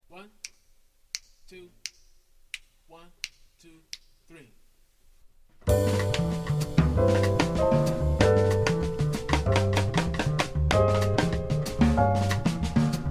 minus melody

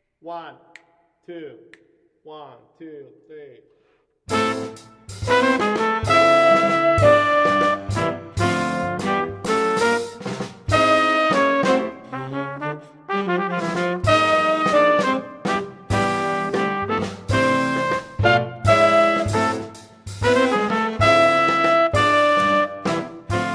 minus bass; the entire original recorded track, minus bass; you have a bass solo

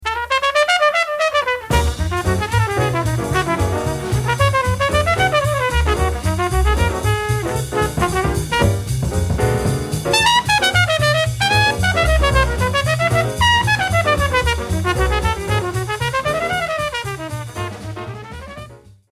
Transcribed Trumpet Solo

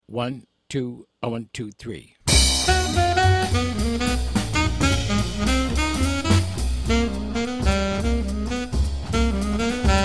minus piano

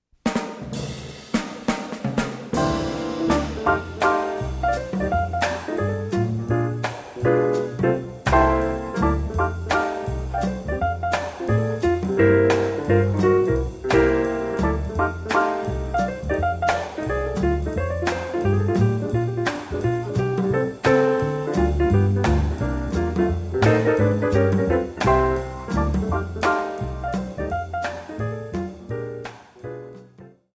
Piano Melody Transcription

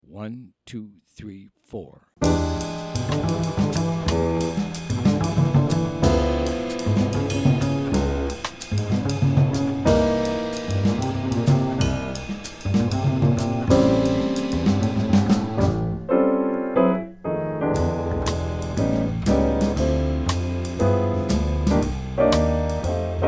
instrumental minus melody; tacet for the intro, or solo; play the melody (optional solo last eight measures); solo 2 choruses

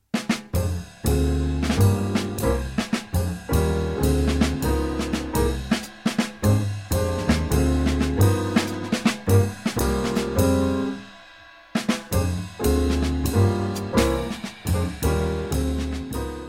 medium minus Melody

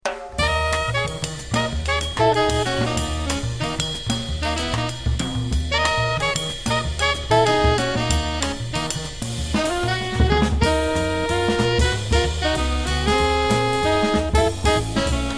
minus piano

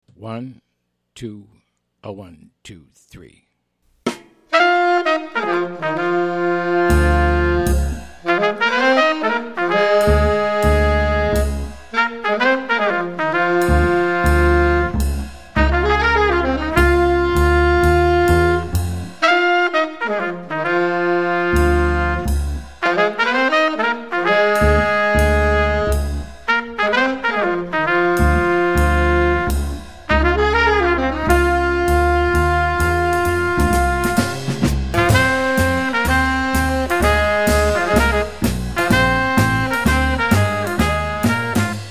minus Piano

